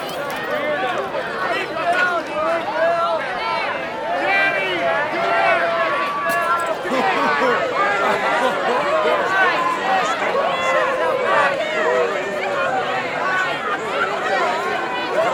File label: Tavern Soundscapes; Sword Coast Soundscapes